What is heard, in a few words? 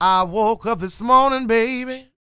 SING W, Vocal Planet Blues & Soul, BLUESY; ILIO